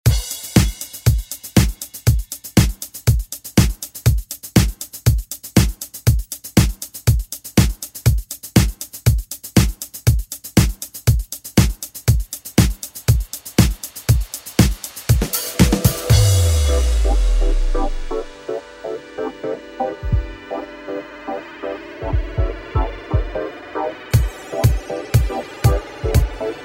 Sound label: 90s; ReDrum